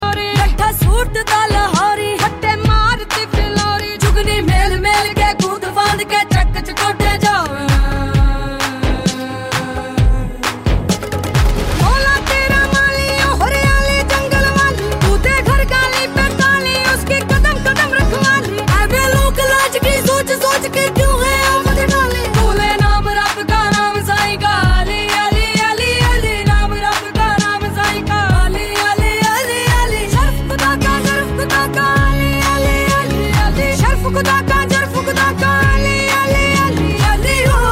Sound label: Female Version